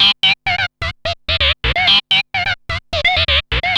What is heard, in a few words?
SKID, Funk Guitar; 90 s Sample CDs; Zero G